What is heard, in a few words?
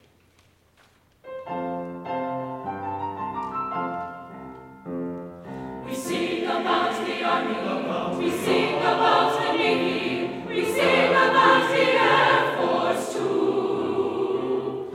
SATB